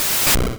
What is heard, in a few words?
Karate Chop; sfx; attack moves; pokemon; gen 1; rby